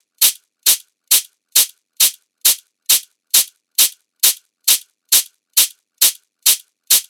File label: Hat Loop 135, Techno